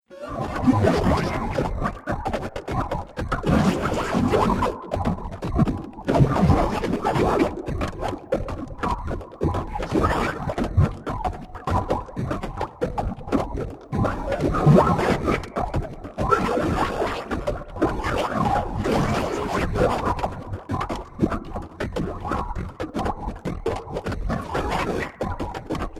Soundscape Glitch Fatigue, science fiction sound effects